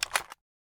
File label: magout, weapons; sako 92; ar; garrysmod